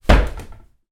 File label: household sideboard unit bash into wooden